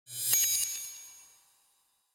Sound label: arcane Spread; spellbound